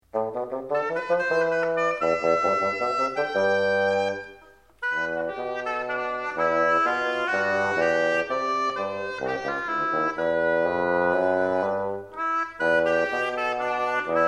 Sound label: Oboe; Cornwall